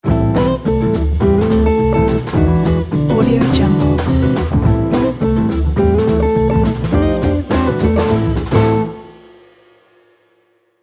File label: jazz guitar logo